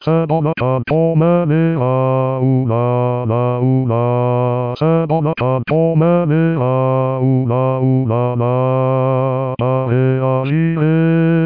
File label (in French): Baryton